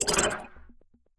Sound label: editor other explo, ui; Source Engine; Portal 2